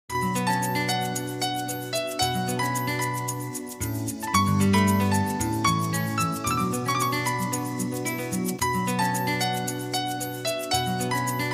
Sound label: instrumental ringtone